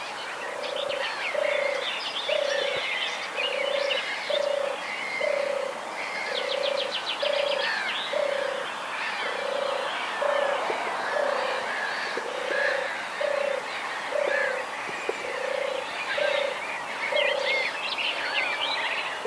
tourterelle des bois

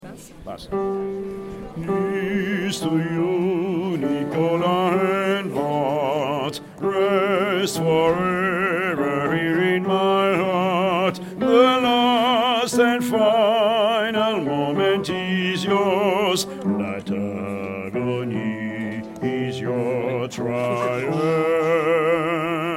basse

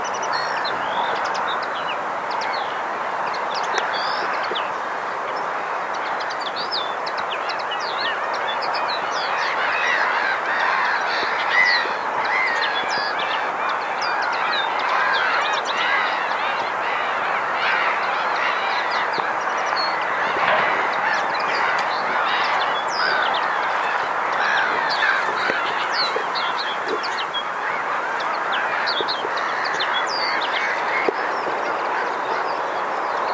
chardonneret elegant

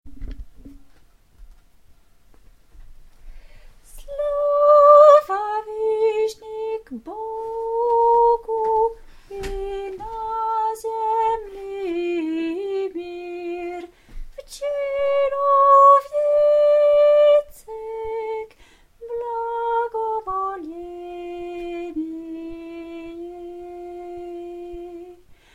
soprano

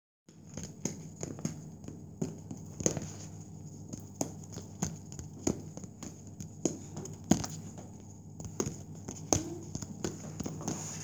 jonglage

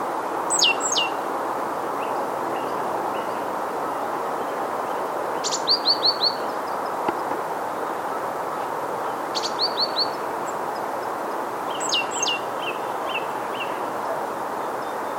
mesange charbo; haute ile

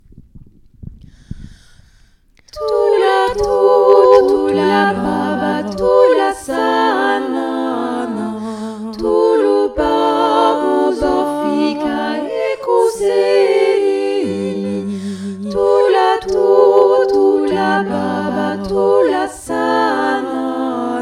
tutti; thula baba

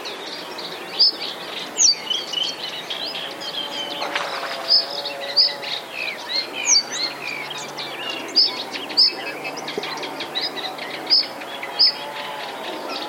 rousserolle effarvatte

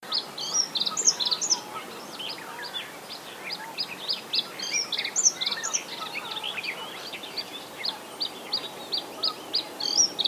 gorgebleue a miroir

